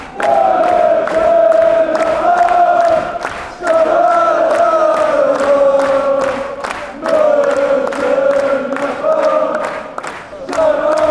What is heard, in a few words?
shalala, chants; monchengladbach